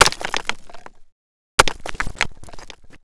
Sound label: Stone Break